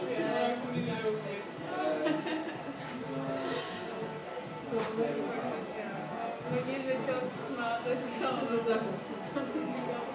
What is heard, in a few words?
60 seconds of cafe ambience; commonbar manchester